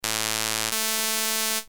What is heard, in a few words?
squ